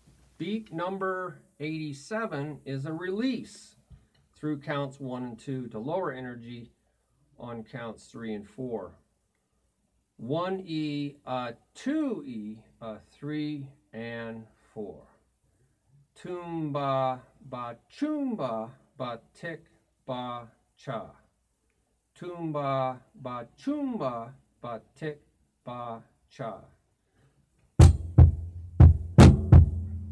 Beat 87 1e a2e a3 4 Toom Ba Ba Choom Ba Ba Ti Ba Cha